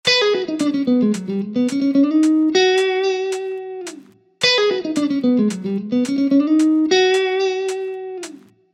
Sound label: Guitar